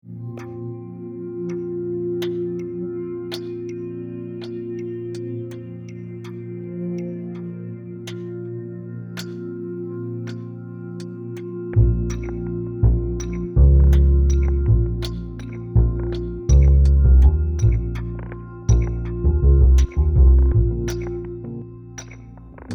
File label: Grind; Bound Zen Bound